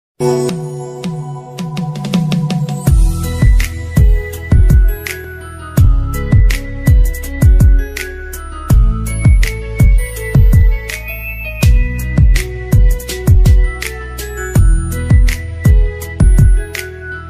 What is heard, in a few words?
instrumental ringtone